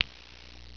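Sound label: FINGER SNAP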